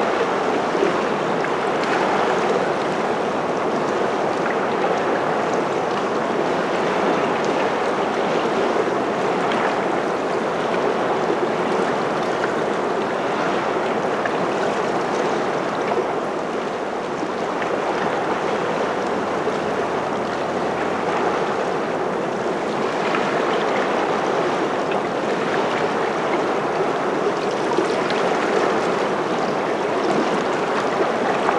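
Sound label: ambience lagoon water lap shore; Titanfall 2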